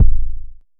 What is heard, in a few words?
analog deep, Kickbank; Ableton Foundation FreePacks